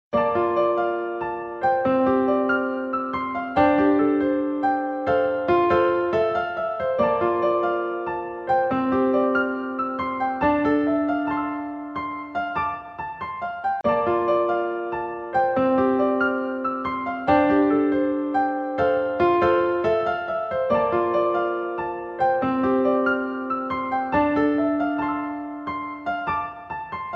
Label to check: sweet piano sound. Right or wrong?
Right